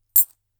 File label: Coin Pick Up Fall